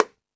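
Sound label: Jungle Percussion, Drum Machines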